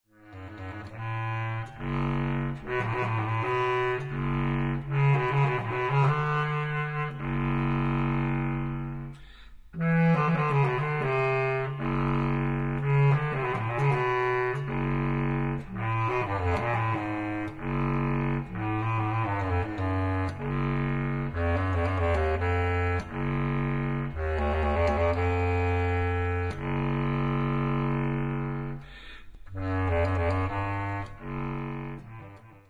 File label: B Flat Contrabass Clarinet; Treny; Laments I